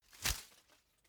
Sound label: whoosh paper scrap flutter; swooshes-swishes